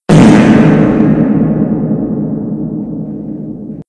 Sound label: pickup; tfc